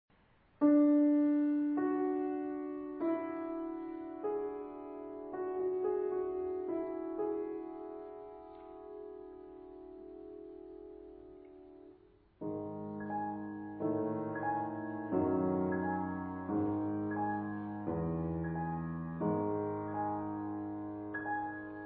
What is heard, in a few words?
chimes